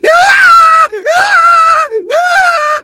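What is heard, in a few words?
engineer pain critical death; voice packs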